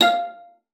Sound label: F5, VIOLA FINGER; Xsample VOL-5